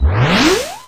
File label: teleport out; mm7sfx